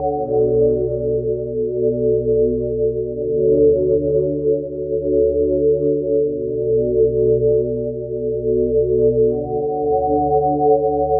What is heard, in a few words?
aliens landing, Electronic Loops